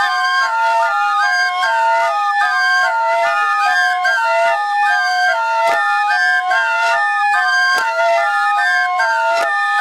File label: 16 bar loop